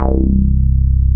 SH101; OLD ROLNDS

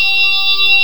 G3 sistrum; NorthStar - The Wizard VOL-2; MYST

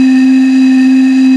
bottle blow; NEW AGE

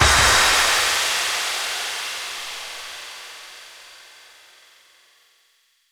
End Stop, FX Atmospheres; Zero-G; Soundclash Nu Dance Electro; Acidized Wavs

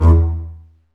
STR CB, Cb Bowed, Cb Marcato; String Master Series

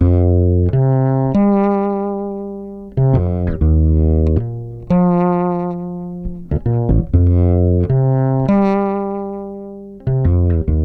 WALK, Bass Grooves; USB Soundscan vol.30; AKAI

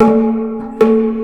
PERC LOOP, Asian Traditions; USB Soundscan vol.60; AKAI

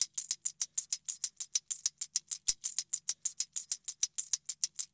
JINGLE; Spectrasonics; Liquid Grooves 1 & 2; TAMB+TRIANGL

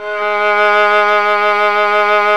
STR VL G#4, solo section; Roland - LA Composer vol. 1

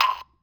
Disco Drum Tom, drum machine; Funky Fever Disco Drum Sample Pack; 300 Drum Machines

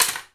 Foley Mid, Drum Bits, Sweeteners; layering

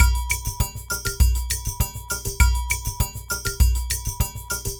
Ensemble, Latin Percussion

